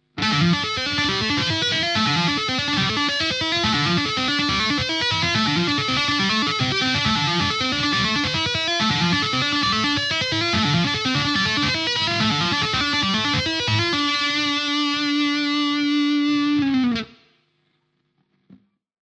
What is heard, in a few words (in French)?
TAPPING DISTO; Damien Elite